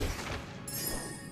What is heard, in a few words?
receiving rewards points; ori and the blind forest